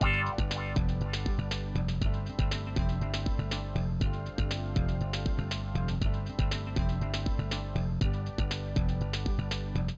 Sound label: keygen song